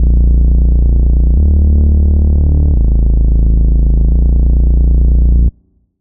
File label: Bass; Routed Sound; Spire Expansion - Flame Summoner Vol.1